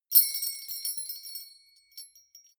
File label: brass small tinkle, percussion